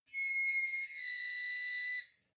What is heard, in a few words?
metal squeal bright high; metall